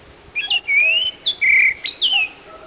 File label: Chinese Grosbeak